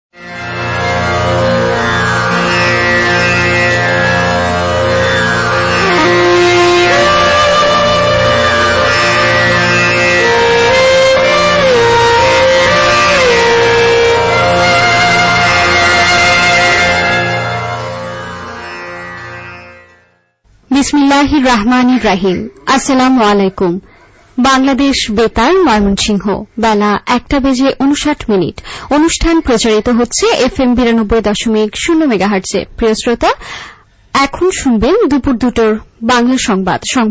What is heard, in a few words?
interval signal; rb mymensingh